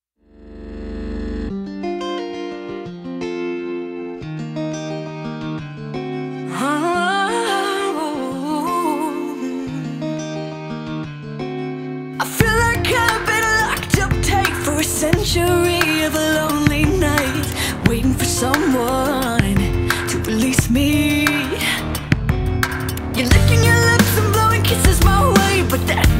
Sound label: (Rock Version)